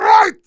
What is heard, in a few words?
Heavy meleeing